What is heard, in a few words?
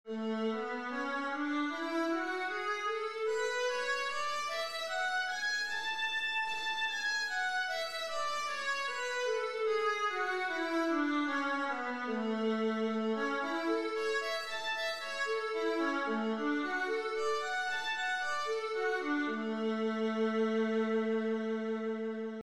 viola a major, scales